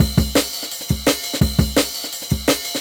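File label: Jungle Breaks, 170 bpm; Boom; Original Jungle Breaks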